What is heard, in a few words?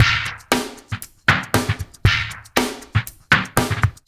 AQUAFUNK; Spectrasonics; Liquid Grooves 1 & 2; 117 AQUAFUNK